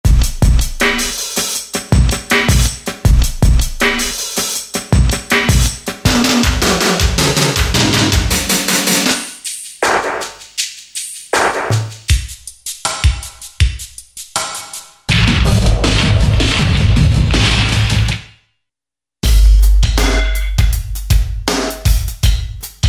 LOOP; Best Service Gigapack

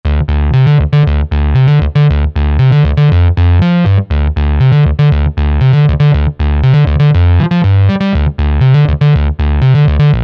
Wild Synth 101, Roland SRX-07 Ultimate Keys

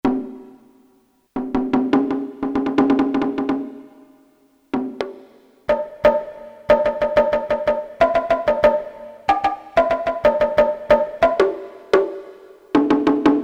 J. Drums, Japanese Inst; Japanese Inst 2

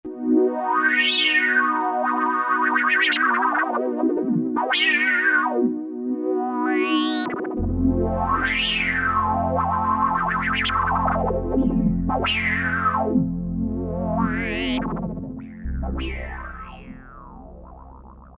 Pad, E-mu Morpheus; FunkShun

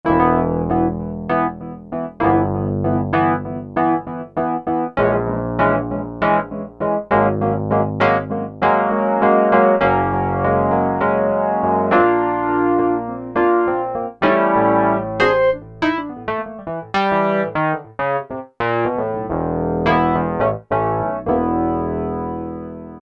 60s E.Piano, Roland SR-JV80-01 Pop

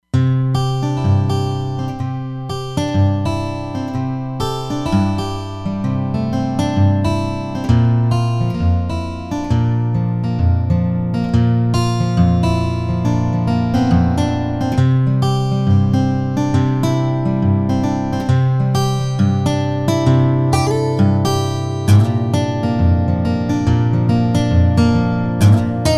Thick Steel, Roland Fantom XR

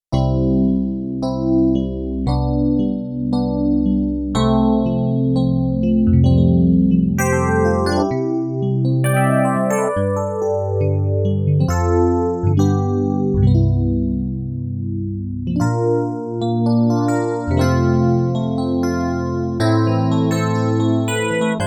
VPM Piano, Korg Radias-R

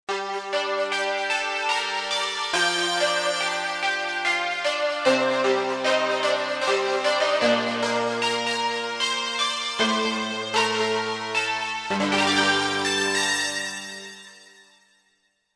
Overtone, Korg MSC-1S; Korg MSC-1S Variety

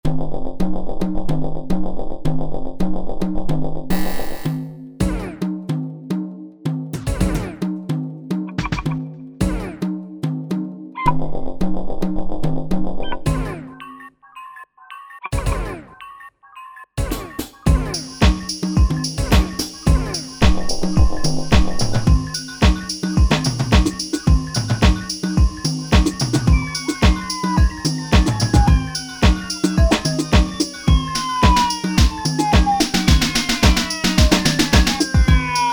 E-mu Proteus VX Tribal House

